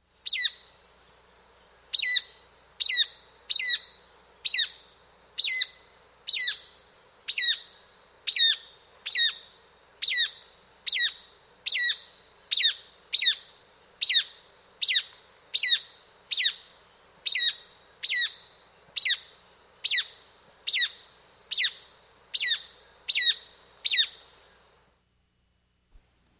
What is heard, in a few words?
Golden-Bellied Flycatcher; S. America